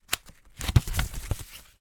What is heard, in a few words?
foley cheque book page turn